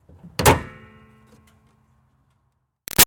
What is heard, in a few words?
Car Highlander Hood Close Lite; 2005 Toyota Highlander V6; Car Sound Effects; Vehicles